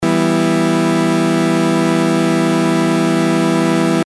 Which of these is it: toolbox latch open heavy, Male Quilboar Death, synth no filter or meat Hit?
synth no filter